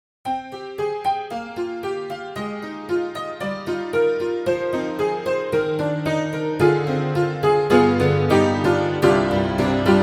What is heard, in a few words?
Upright Pianos Tack Piano Double Trouble Tack; Synthogy; Ivory II